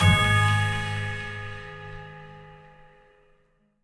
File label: bonus Game Start; secrets of christmas